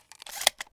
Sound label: spas12 reload, weapons